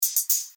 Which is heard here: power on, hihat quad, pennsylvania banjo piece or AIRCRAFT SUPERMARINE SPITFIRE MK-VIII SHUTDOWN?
hihat quad